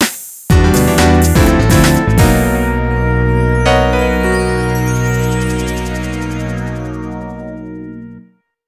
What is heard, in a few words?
Jingle; Gran Turismo 4